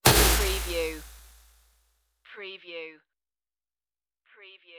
futuristic bullet impact

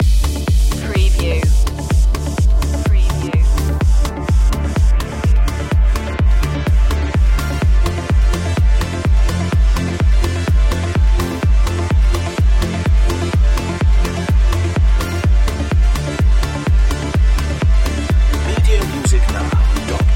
progressive skill loop

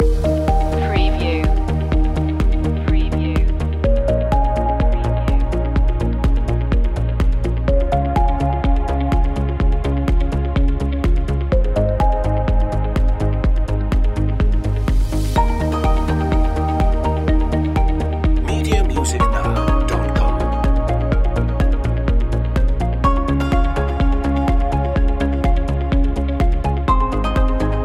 cloud environment loop

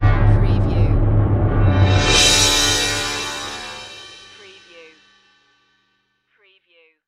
Music Orchestral

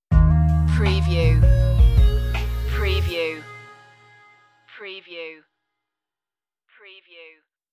oriental stinger

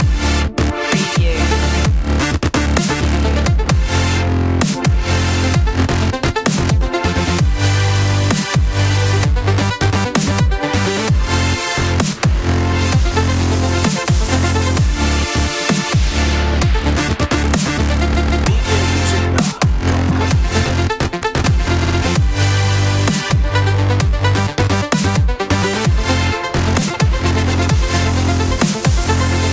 beat the grind loop

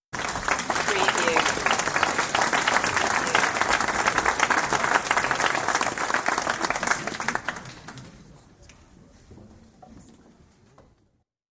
Audience Applause; beanstalk audio

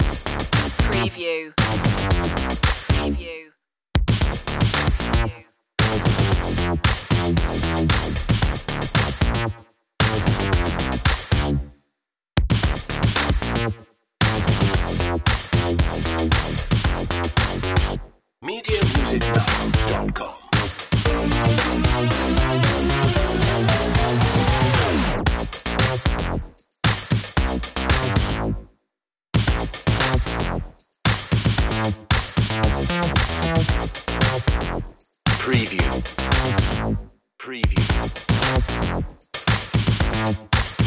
bass and drums

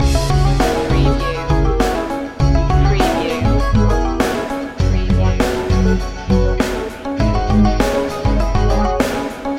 urban beat loop

